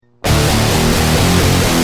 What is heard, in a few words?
Guitar Rage, Industrial